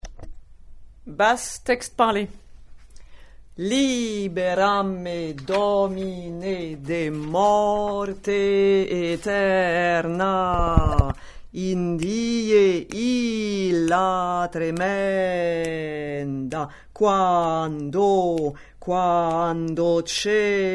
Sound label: bass, text; Texte parlé